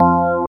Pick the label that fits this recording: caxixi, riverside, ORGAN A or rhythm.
ORGAN A